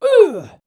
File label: uhhh